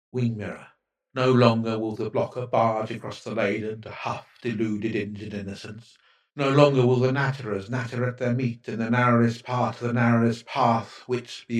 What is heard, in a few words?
crowd